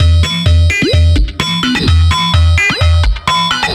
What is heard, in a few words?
Perc 128; musicradar